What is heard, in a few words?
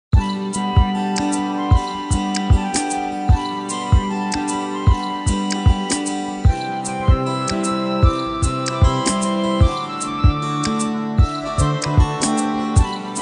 Flute Ringtone